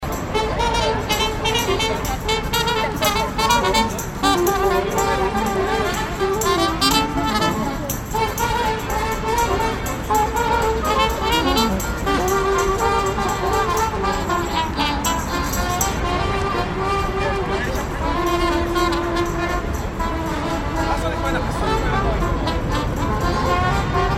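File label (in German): Rumänische Strassenmusik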